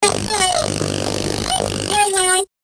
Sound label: discount sound, marketing